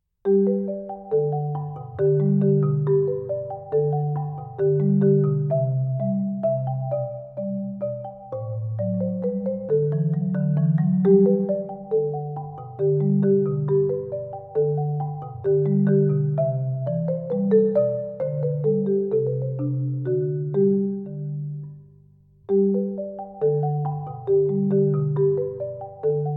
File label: Menuet